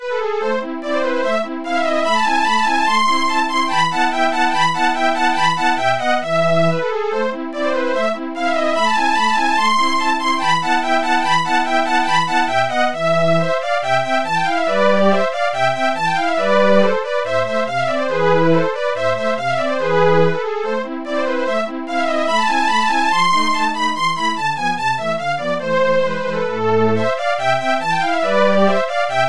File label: sweep pad